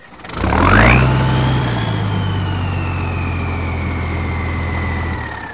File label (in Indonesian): suara mobil